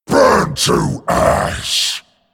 Male Dragon Attack

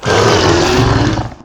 Scaleworm Death

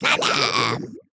Piranha Death

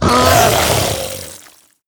Infested Gnoll Death

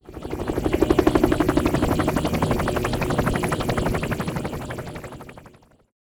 Play Underlay; Mimiron

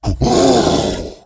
Knuckles Attack

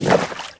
Ink Attack

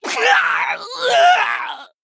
Male Human Death

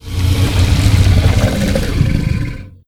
Giant Sand Worm

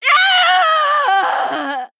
Female Sanlayn Emote Death

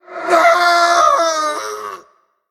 Bone Golem Death

